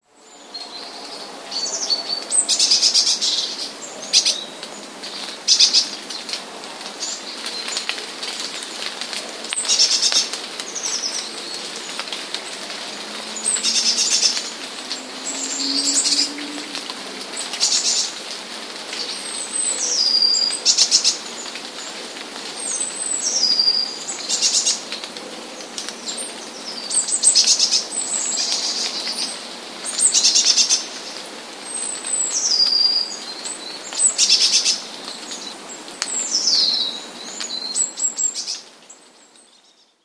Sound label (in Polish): modraszka, prawdziwej modraszki; wroblowe; zapozyczenia